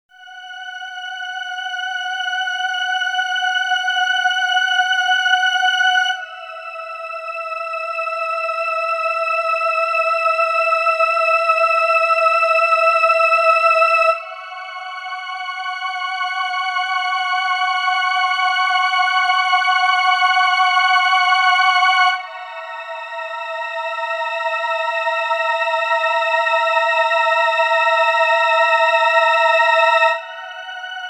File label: SYNTH